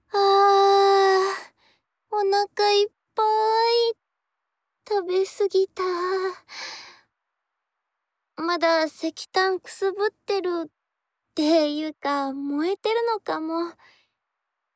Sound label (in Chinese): 划槳時發出的水聲。鯉魚的游泳聲和被鯉魚咬手指的聲音; 蓄音 萬岡; 用划槳在小船上飯後休憩